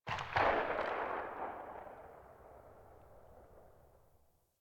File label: Hand Shotgun Prime Tail